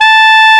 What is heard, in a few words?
A4 SMO SAX; AKAI S6000 CD-ROM - Volume 1; BrassWind